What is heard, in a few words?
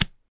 tabla re